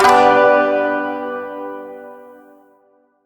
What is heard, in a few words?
Shawzin Maj Chord H; Warframe